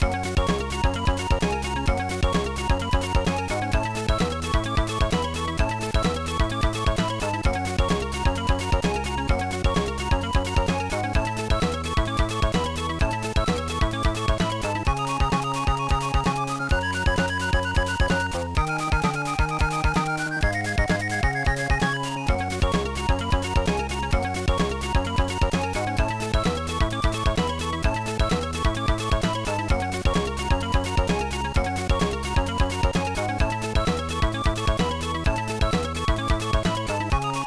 BGM; Hamster Paradise Pure Heart; gba

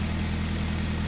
Range Rover Idle; car simulator 2

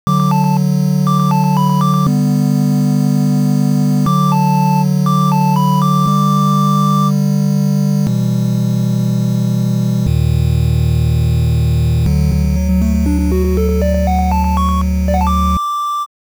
Ocarina

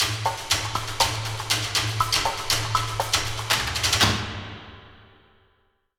Ghost Forest Combat Intro

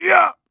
warrior attack swing

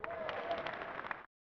Applause; Rap Jam; snes

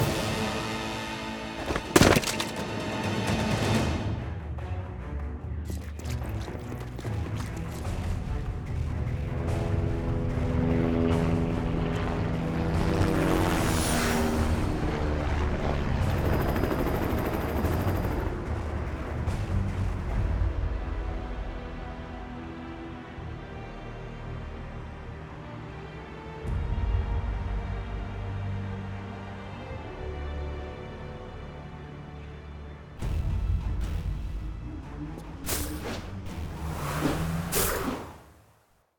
Outro Sfx; Oscorp

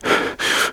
Fatigue Medium

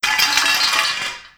Scaffolding Break; angry birds rio

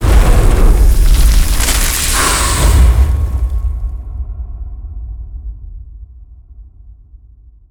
kill shadow big snake

